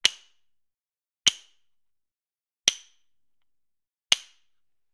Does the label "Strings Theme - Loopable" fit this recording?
No